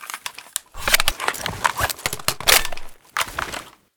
mg36 reload, weapons

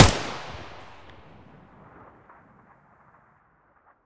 ak74u muzzle stereo; STALKER; IWP

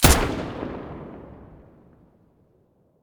hk416 muzzle stereo

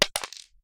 shell 9mm wood; STALKER; IWP

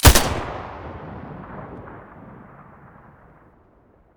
scar muzzle stereo

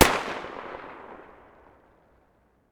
tt33 muzzle mono far

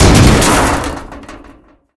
hit hard, barrel collide; STALKER; IWP

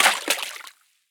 riffle drop puddle; STALKER; IWP

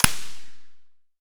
svd silencer mono distant

